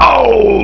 Die; Lawyers 1.0

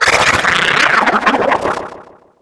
triton die; DAoC

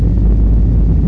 ENGINE; Big bang

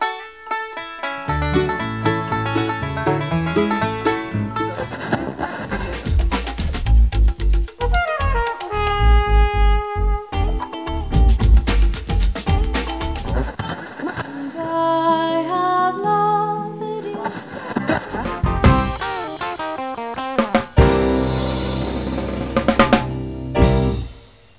theme

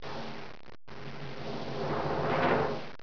tympani; 12000 Graphics Pack - A Complete Graphics Collection For Your PC

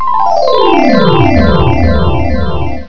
Bells

